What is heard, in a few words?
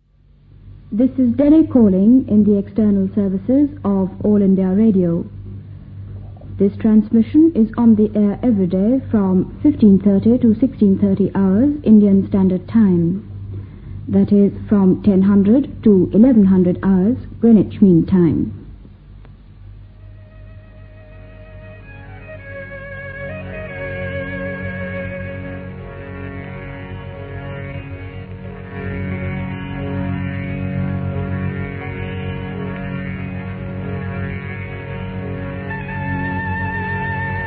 interval signal; air external c1965